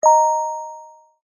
multimedia alert bell chime ping notification simple